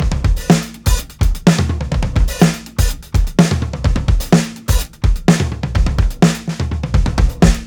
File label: 125 TOMS FILLS, DRUMS LOOPS; BASEMENT DRUMS VOL 2